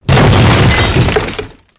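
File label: explosion big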